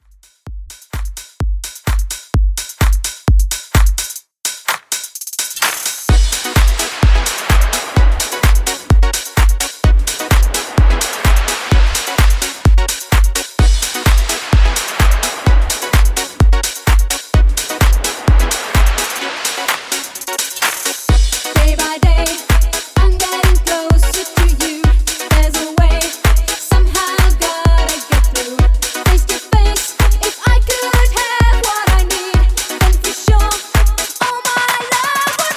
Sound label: 90s Redrum 128Bpm